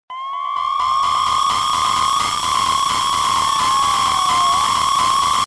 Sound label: Space ringtone